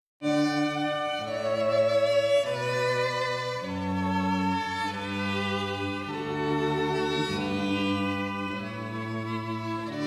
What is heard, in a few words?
String Trio, violin, viola, and cello; Pachelbel, Canon in D